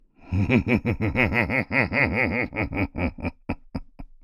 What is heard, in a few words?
voice long evil laugh; Sheriff Of Nottingham